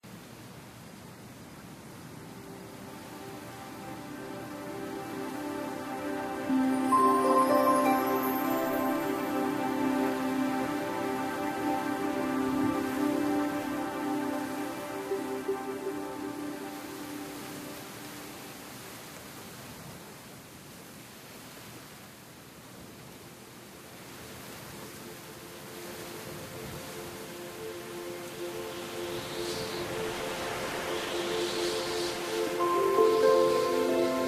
Birthday Ambience; angry birds time travel android gamerip 2019

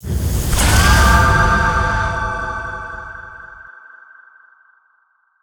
Spear attack; Yu-Gi-Oh! Master Duel

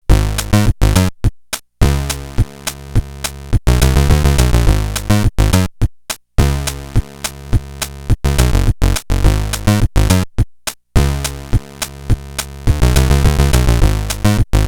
Shop (Chiptune version); The Dark Spire

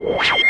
Lamp Exit

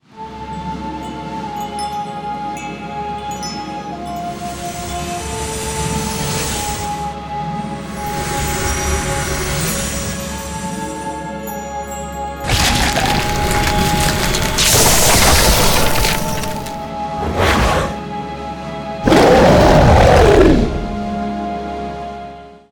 Boss Intro Music

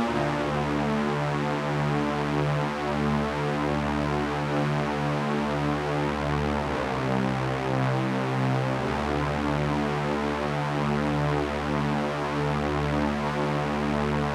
Pad; nowhere station; Instrumental

